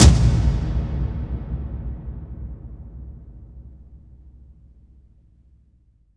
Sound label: toms, cinematic impact, drum